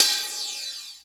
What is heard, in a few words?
HH, Dance Mega Drums; Best Service; HH-HIP